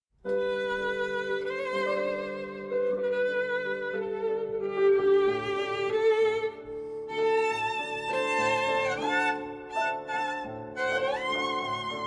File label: Piano and Violin